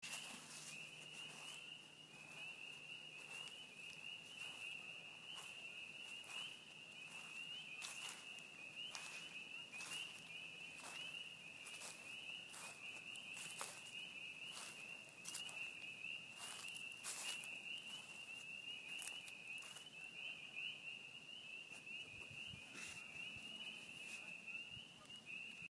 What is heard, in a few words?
peepers in spring